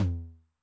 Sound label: Noise Med, 909 LOW TOM